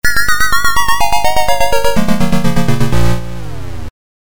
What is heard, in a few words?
game over; Amaurote